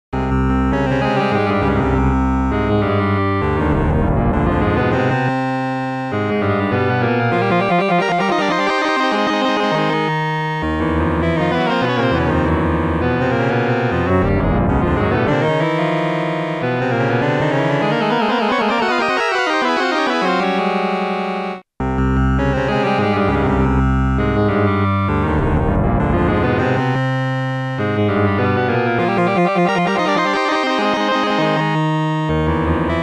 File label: Dungeon; u3-amiga